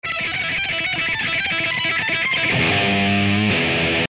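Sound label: Guitar Riff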